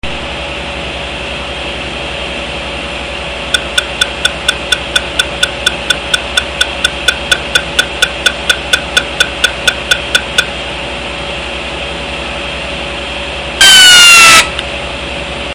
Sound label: hdd, Defrag